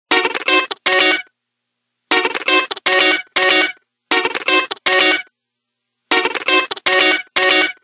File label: Ring